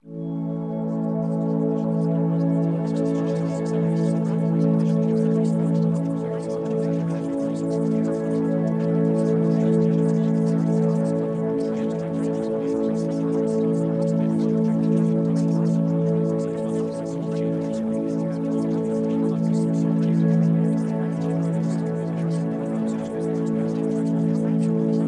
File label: Music; Spiritual